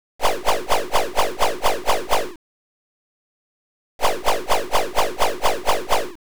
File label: Pole Swing; Oretachi Game Center Zoku: Yie Ar Kung-Fu